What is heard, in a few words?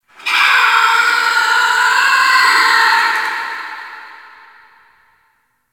Ice Critter Distant; Warframe; Corpus Ice Planet